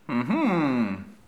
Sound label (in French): hum reflexion